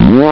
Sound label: fart